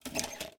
Scarf Open; MiSide

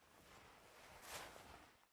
Player Hand Use Free; MiSide

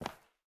Step Heel Wood; MiSide

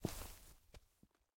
Ladder Up; MiSide

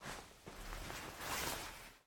Move Bed; game audio; Mita; MiSide

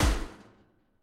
Cage Closed; MiSide

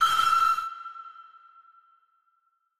Screamer; game audio; Deadmen; MiSide

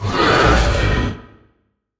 Monster Screeching; MiSide

